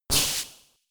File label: Sand Attack